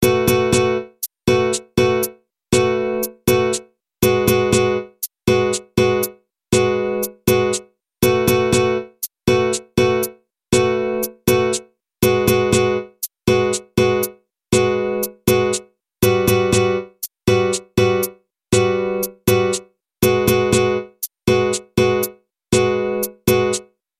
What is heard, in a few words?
bgm main; tamagopet